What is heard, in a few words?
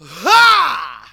VOX SHORTS; Megabass Remix! 2